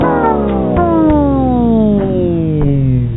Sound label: Muzak; Duke Nukem 3D; MS-DOS Version - Sound Blaster